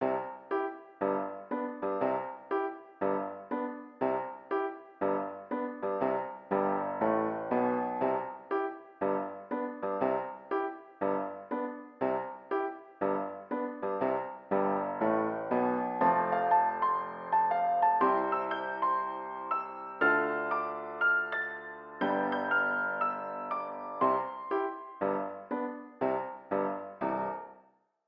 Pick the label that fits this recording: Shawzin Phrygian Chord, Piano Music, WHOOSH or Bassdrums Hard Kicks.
Piano Music